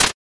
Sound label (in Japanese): shu